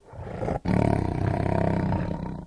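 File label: Tiger Inhale Long Growl; big cats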